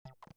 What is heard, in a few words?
modal Close